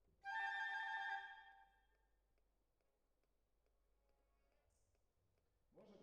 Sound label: Violin Solo